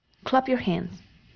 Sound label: clap your hands